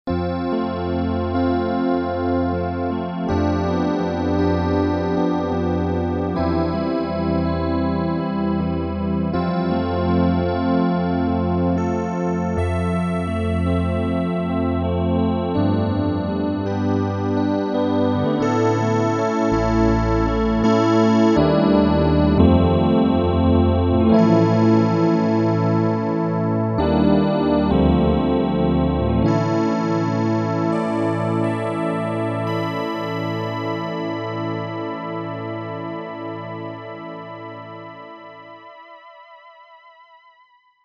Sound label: chorused e. piano, Korg DW-8000; Factory Patches